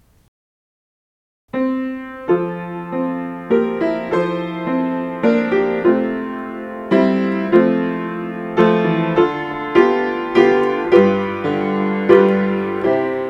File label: Psalm 23 The Lord Is My Shepherd, piano, With Voice; Psalm 23, The Lord is my Shepherd, I shall not want (With Voice)